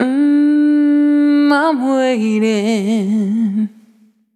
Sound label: Single Voice Melodic Loops; Pocket Diva World Class Vocal Samples